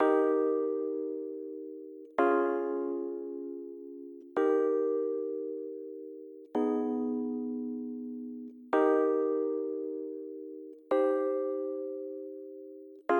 rhodes; Toki Tori Collection

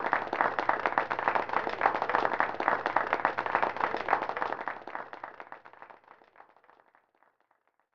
Applause; ESPN Speedworld; snes

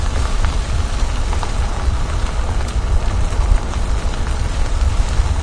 Vehicles Generic

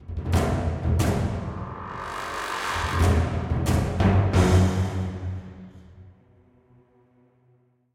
String; Middle-earth Shadow of Mordor

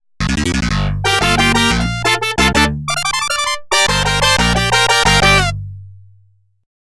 RACE START; Taito Digital Sound Archives Arcade Vol.2

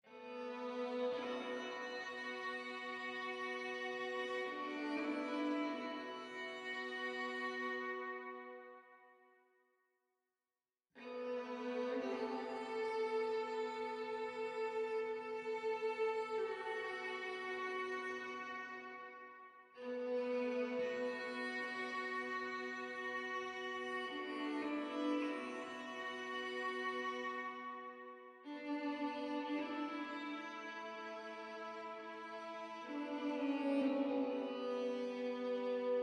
violin; fatebinder; Tyranny Gold Edition

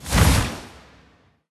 SFX Power Soulimpact Impact

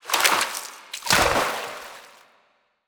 Field Mat Tap; Yu-Gi-Oh! Master Duel

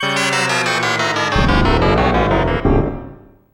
Failure; Gex 2: Enter the Gecko

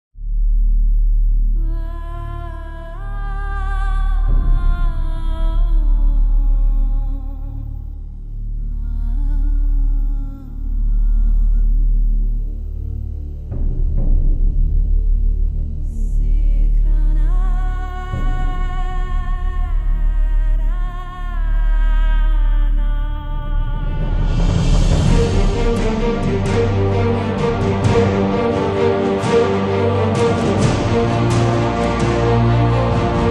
Desert Music; CastleMiner Z; Xbox 360

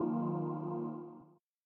Play Countdown; Aenigma OS; Wii U

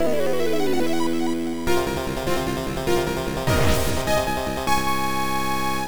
Starting Point (Start); Nemesis 2; MSX

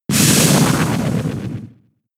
Powder; attack moves; Pokemon; gen 7; SUMO; USUM; Powder part 1

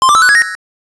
Coin; Mr. Do! vs. Unicorns; x68000

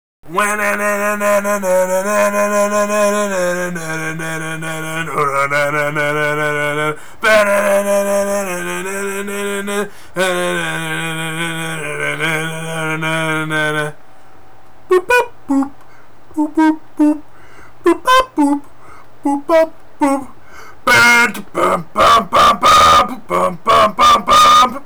Acapella Soundtrack Medley; Super Meat Boy; Digital Special Edition Soundtrack

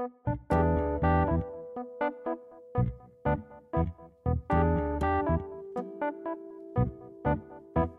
Music Loop Blues; Yousician; Android

